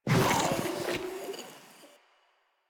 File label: Infested Ancient Attack; Warframe; Ancient Ninety Nine; Proto Infested Ancient